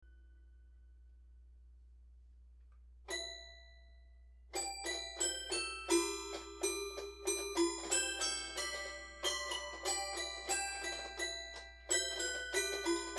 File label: Simple Canon, Toy Piano